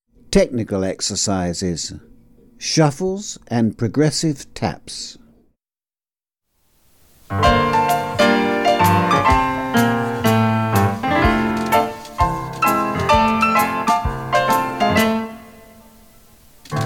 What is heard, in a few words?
shuffles and progressive taps